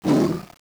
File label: Hound Attack